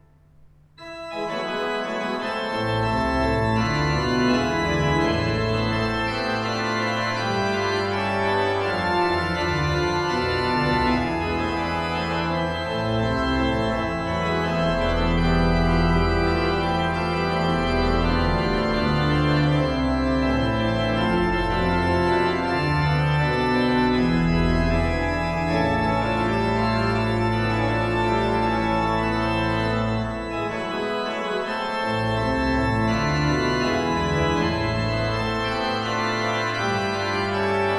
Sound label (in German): Orgel; St. Stephanus; Schwendi; Wer nur den lieben Gott laesst walten; Joh. S. Bach